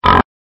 pig oink

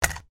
NAVIGATE

